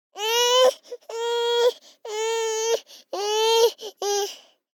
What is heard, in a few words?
BABIES GROUCH Boy 12 months Impatient; Baby Boom